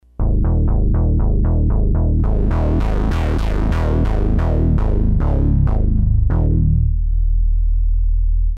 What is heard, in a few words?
Contour Bass; Kris Rhen Presets